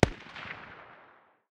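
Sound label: light machine gun far distance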